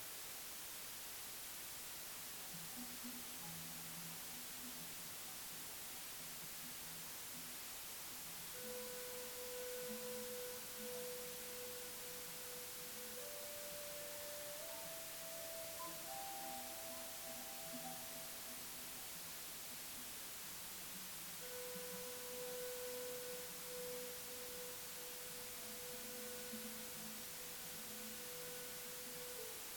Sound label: white noise for tinnitus sample